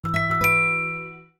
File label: alarm; LINE